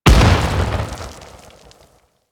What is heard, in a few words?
Teralyst Close Step; Enemies; Steps; Warframe; Eidolon